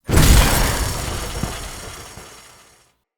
Pipe Destroy; Encounters; Ode Pollution Tower

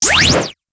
Fire; Warframe; Weapons

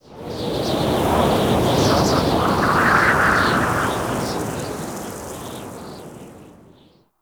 Exterior Wind Gust; Warframe; Duviri Murmur; Ambience

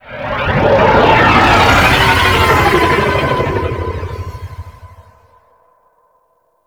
Fly By Slow E; Vehicles; Flight; Warframe; WM Liset

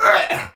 Pain; Warframe; Tau; Dax; Ashigaru

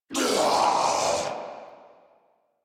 Death Corrupted Grineer Male

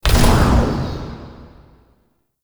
UI Mod Deactivate Generic; Warframe; Railjack; Player Ship

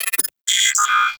Computer Beeps; Warframe; Guild

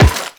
Run Metal, Footsteps; Warframe; The New War; Characters; Kahl

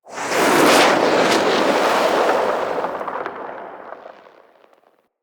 Arrow Barrage Whoosh; Level Events; Midrath; Warsong Prologue

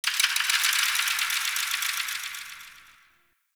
High Mechanics, Ambience; Guild; Warframe; Misc Remaster

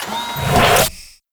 Animal Foundry Shell Open; Liset Machines; Tenno

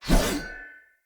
Short Sword Heavy Whoosh; Weapons; Player; Swords; Sollos

